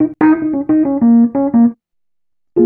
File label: ACID JAZZ